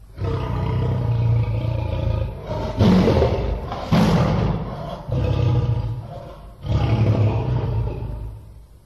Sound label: lion; Cirque